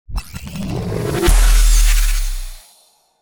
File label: steam burst